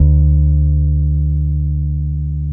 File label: DUB BASS, Electric Basses; PlatinumPhattCD2; E-MU Producer Series Vol. 8 – Platinum Phatt